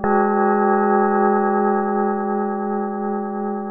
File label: gong